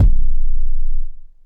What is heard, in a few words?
kicks, Bass Drum